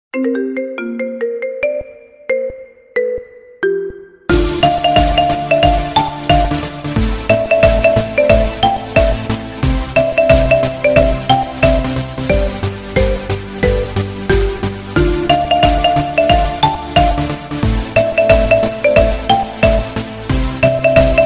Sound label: Marimba Ringtone